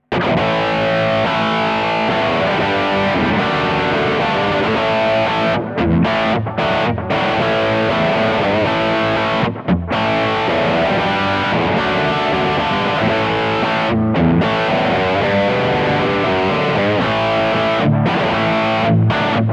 Kemper vs Quad Cortex Dirty Rhythm Kemper

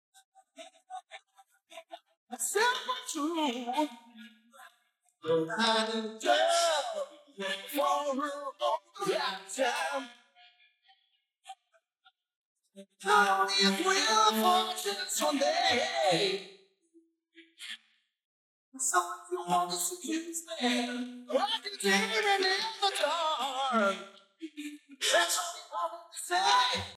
Magix Acid Pro 10 Stem Voice Strict

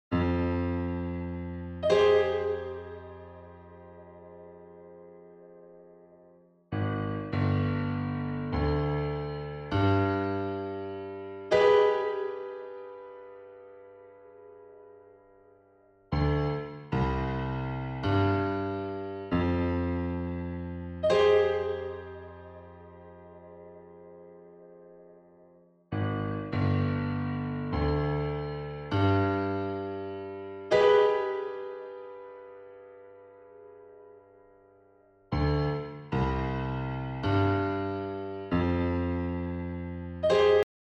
Piano Choir; Tverb; at Hansa

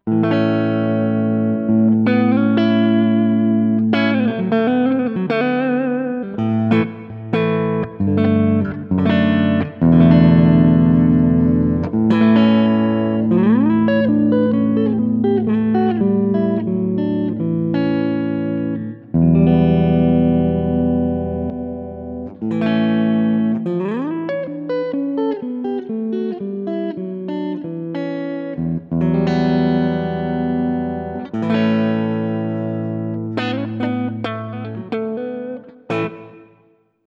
Neck Pickup Clean; Ibanez RG631ALF

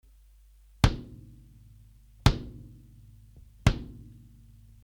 18 x 16 Kick; Kirchhoff Test

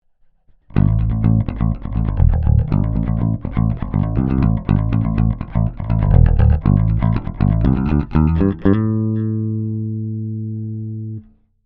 Ibanez EHB1505 Neck Bridge Pick Active

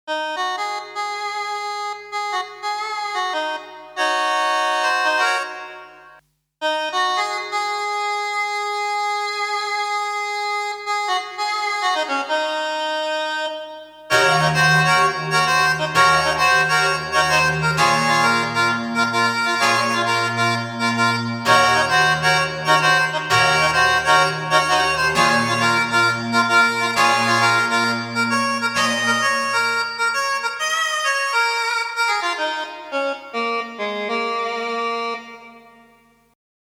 Modulation Flanger; Roland SDE 2000

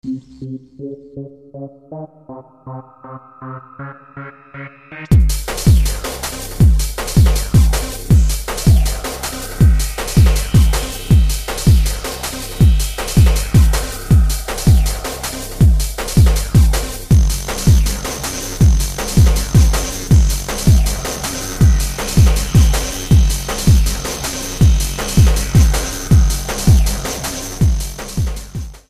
hardcore

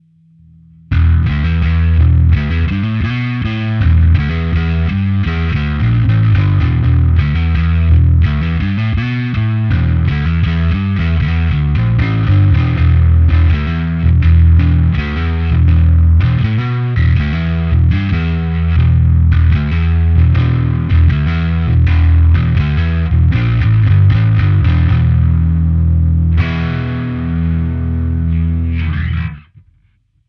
Distortion Alpha

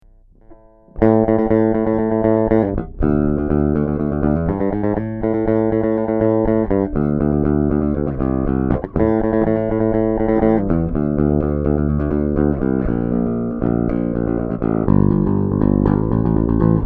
Harley Benton BZ 5000 Bridge PU passive Plec

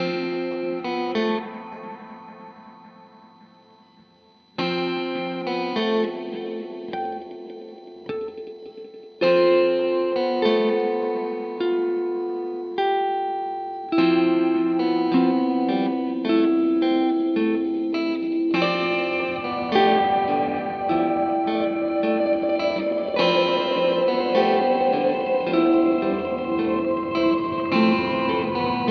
Walrus Audio ACS1 Fullerton Stereo

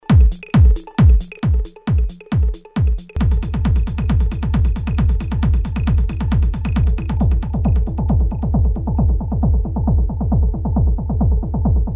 Pan Echo Filter

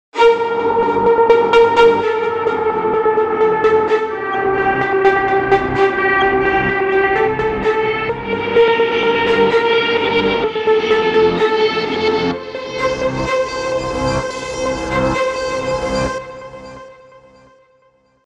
Fantasy ARP; AMB A NOVO

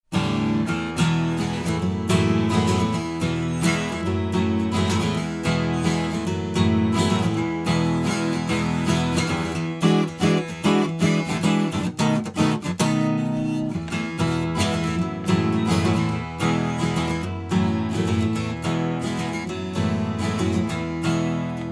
Taylor 12 string; Orpheus; Black Lion Audio Auteur MK2

